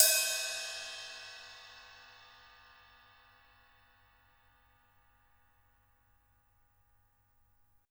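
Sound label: Jazz kit ride, Drums Acoustic; USB Soundscan vol.10; AKAI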